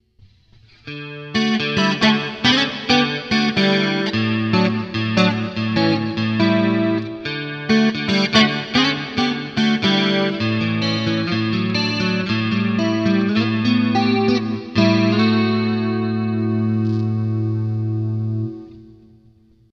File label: 2 x 2 Chorus, Boss GT-8